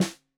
Snare 65 Reg Stereo, Deeply Sampled Drums

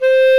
Clarinet & Bass Clarinet

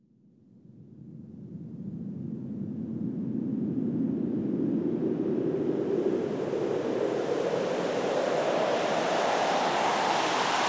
Sweeps Up, Effects

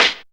snare, Rave Kick Snare, Percussion; Best Service ProSamples vol.37; Dance Synths

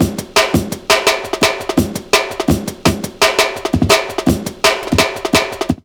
LOOP, Explosive Jungle

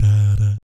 BS DADAT, Vocal Planet Jazz & FX; ILIO; JAZZCOMBO

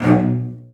CELLOS C#2, Synclavier Strings; ILIO

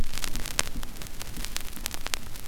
SCRATCH NOIZ; AKAI S-Series CD-ROM Sound Library VOL-5; NOISE

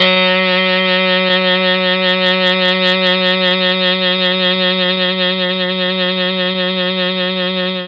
F#2 MKII SAX, Mellotron; Mike Pinder - Mellotron Archive CD

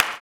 CLAP, blues & soul; ILIO; Vocal Planet

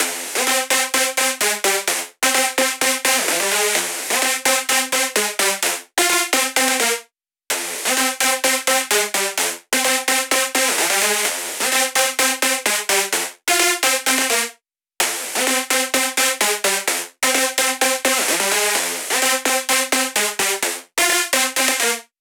128 BPM lead Synth Add Dry noSC, G; Vengeance; Essential Clubsounds Vol.5; Demo Constr Kits; Sidewalk Kit

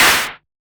Noise Clap; A D KIT

